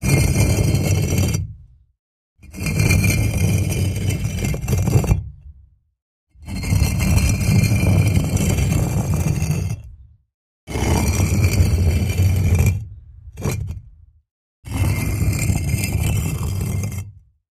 Stone, Heavy Brick Move, Push, Long x5

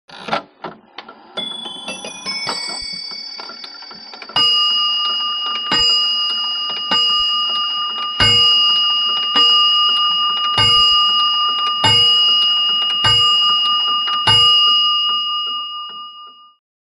Clock Ch, clock chimes rings; George Clark Clock Chimes

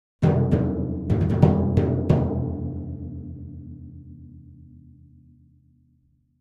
Timpani, (Hands), Final Hits

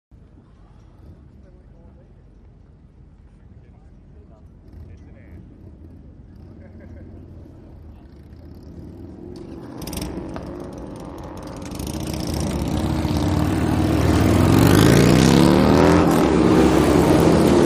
Motorcycle Race, road racing

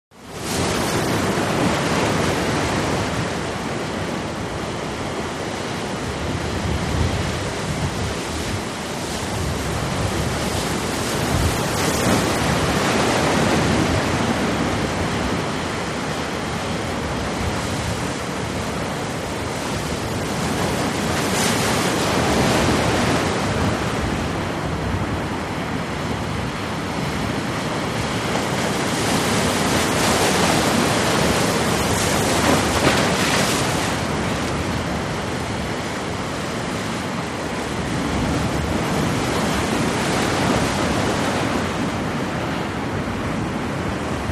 Storm Wind Sea Storm, waves

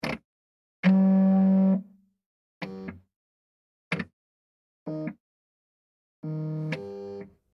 Scanner Warming Up Mo, fax scanner copier

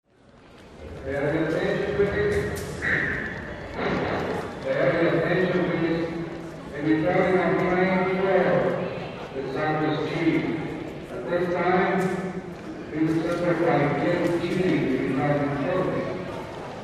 Train Station Amb

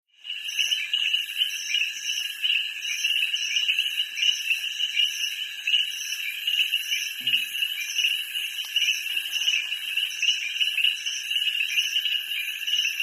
Frogs Peepers Dist Cr

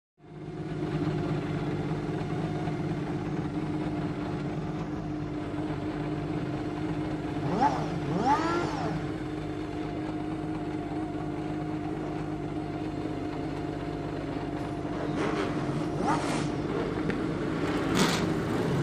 Sports Car Race Amb

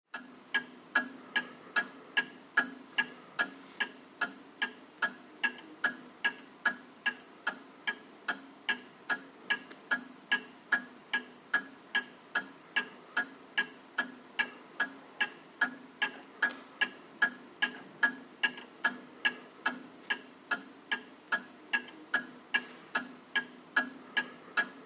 Old Mantle Clock Tick

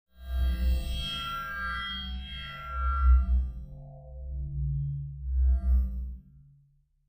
Glass Triple Glass Re

